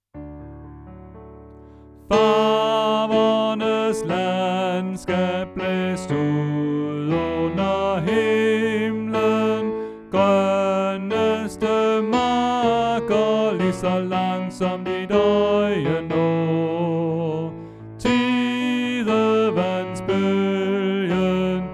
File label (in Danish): Tenor; oevefiler